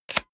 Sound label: plastic device handling clack push